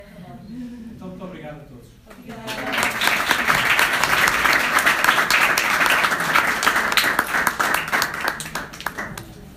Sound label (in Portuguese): Som Aplausos